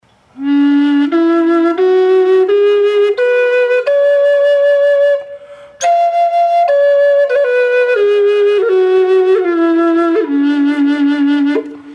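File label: D minor wet, flute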